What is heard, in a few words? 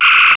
laser